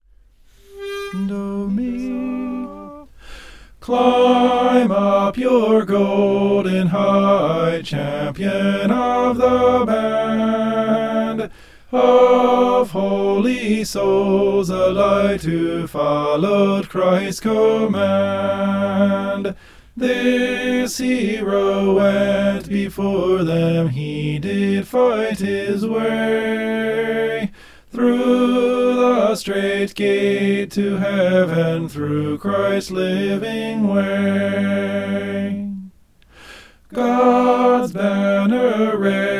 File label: soprano